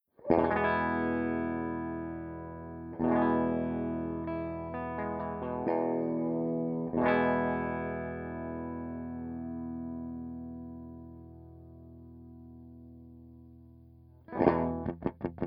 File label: AC30 NORMAL